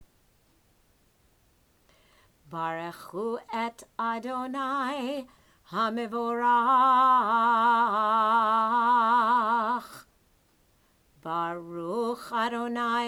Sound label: Barchu call and response; religious school; student prayers